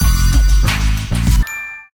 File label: win; Amatic; Casanova